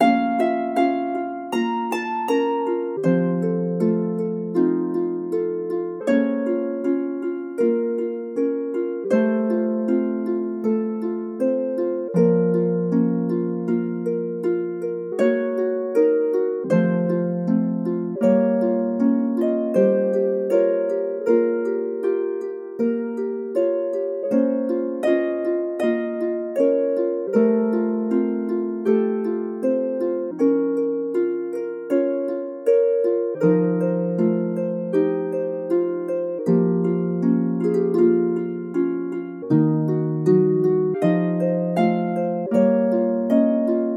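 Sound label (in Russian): Zvuki Arfy, harp sounds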